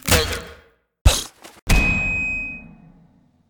game Over SFX

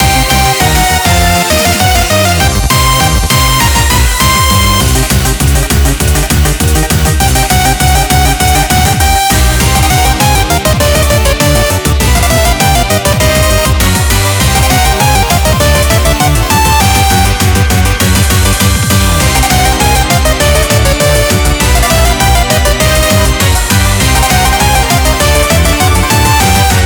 BGM Reach

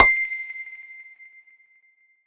UI Menu Element; Skylanders Cloud Patrol; Arcade, iOS, Mobile

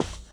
Foot Man Carpet

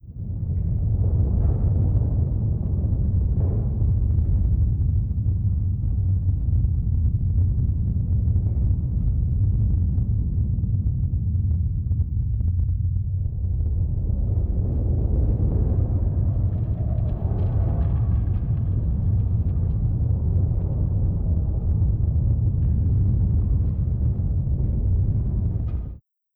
Nileriver Rumble; Google Earth VR

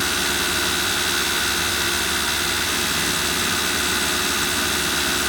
Engine Kart Rpm Low; kart racing; Boom Karts; Android

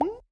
Slime Vox Small

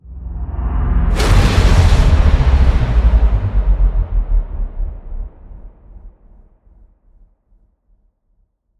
Stinger Modulate

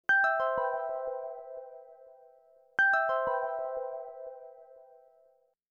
Alarm; Windows 8 (Windows) (gamerip) (2012)

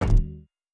Armor Hit

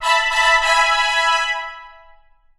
Monster Lv

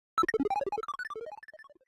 Boss BigPunch Stinger; Avie

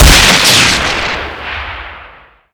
Train Explode; Skylanders Cloud Patrol; Arcade, iOS, Mobile; KO

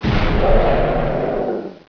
Bee Die

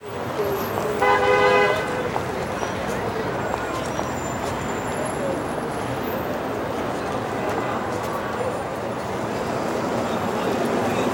Niagara falls City

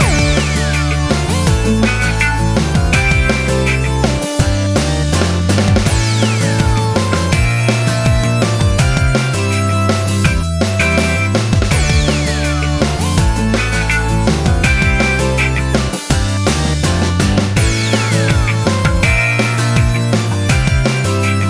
Poprock Boucle; Imagine Modern Dancer; Nintendo DS

